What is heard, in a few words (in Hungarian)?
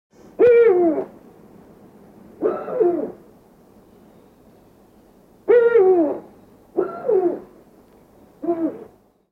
Bagolyhuhogás